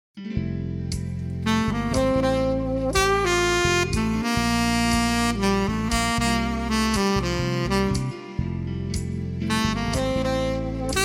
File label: TENOR